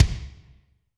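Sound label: Kick Monster Room, Alesis D4; Kik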